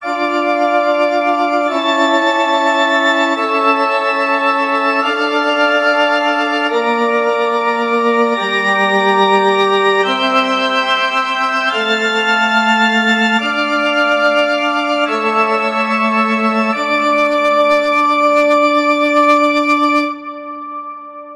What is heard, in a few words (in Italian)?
Esercizi di contrappunto a tre voci; Erste Gattung; Ronge pagina 61